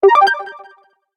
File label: New MMS, notifications